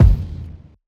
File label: roomy kick drum one shot G key, steel kick drum sound tuned to the G note; kick drum samples